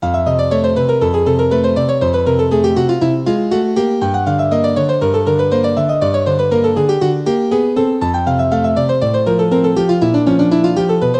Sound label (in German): zirkusmusik; zirkusszenen nr 10